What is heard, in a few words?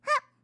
jump, Catgirl Fighting SFX